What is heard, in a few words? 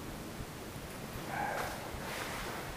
scream